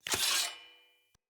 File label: Sphere Dual Sword Equip; Weapons; Player; Swords